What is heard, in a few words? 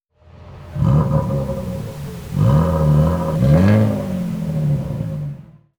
Rev pink